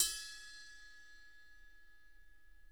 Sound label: RIDE 2 BL MF R, Interactive Drum Kits; Sonic Reality